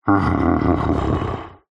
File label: horror yeti monster groan happy content; zapsplat